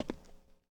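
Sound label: Foot Concrete Shoes Walk; Lucius III; pc linux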